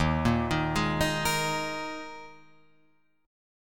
Eb Suspended 4th, Guitar, Open F; Ebsus4; arpeggiated

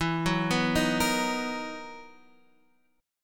B Major 7th E; BM7/E Chord {X 7 4 4 4 6} arpeggiated

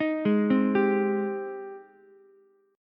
Ab Major 7th Ab, Ukulele; AbM7; arpeggiated; chordshape; 8,8,8,10; JGuitar

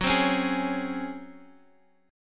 Db Major 7th Suspended 4th Gb

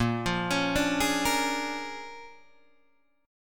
Bb 7th Sharp 9th Bb Guitar Open D, chord

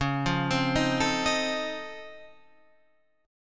C sharp Augmented Major 9th, guitar chord

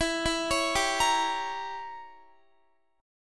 E Diminished 7th E, Guitar; Edim7; arpeggiated

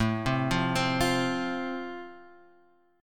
A Minor Sharp 5th A, Guitar, Half Step Down

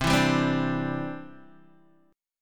C Major Add 9th, C, down, Guitar, Open D

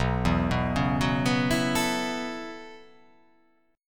B 7th Suspended 2nd Suspended 4th down, chord

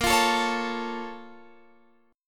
Bb Minor Major 7th, Guitar, Open F; BbmM7; strummed; x,17,17,16,16,16; JGuitar

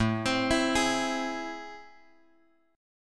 A Minor Major 7th; AmM7 Chord {5 X X 5 5 4} arpeggiated

